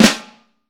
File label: FLAM, Vintage Snare Drum; 3.5X14; Q Up Arts; Johnny.C's Vintage Snare Drum